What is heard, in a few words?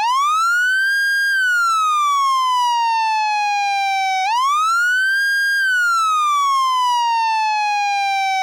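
Federal Signal MS4000 Scan, siren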